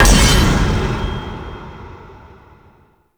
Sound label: Sword Block Break; Warframe; Duviri; Dax; Weapons